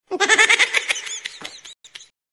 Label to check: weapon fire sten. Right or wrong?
Wrong